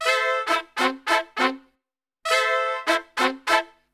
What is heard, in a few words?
BRASIL, Brass; Best Service; Brass Super Section; BRASIL LICK